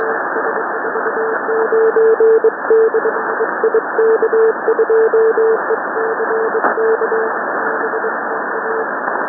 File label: sending random prefixes